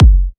Trance Kicks, Bassdrums

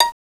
VIOL PIZ, Fairlight CMI, STRINGS; STRINGS3

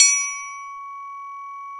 triangle, Yamaha TG-500

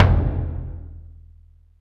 CONG TOM, CONGA BONGO

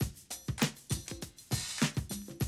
drum loop, 100 BPM

